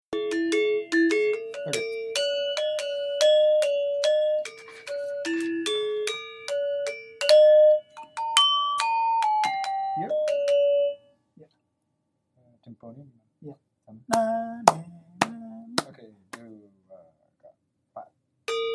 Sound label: rejang kusuma 2nd section, gamelan